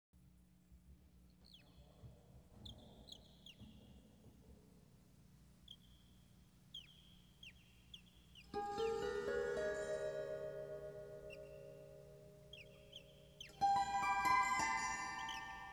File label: Rain Forest